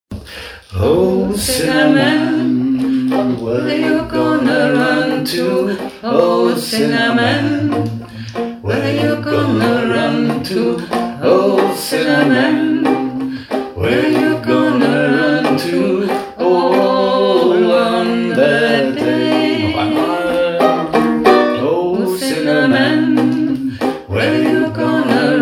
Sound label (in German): 3 stimmen; sinner man 2 stimmen